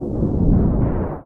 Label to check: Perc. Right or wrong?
Wrong